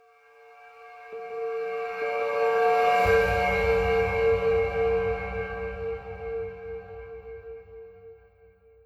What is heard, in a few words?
bingo Gong; bingo Boss App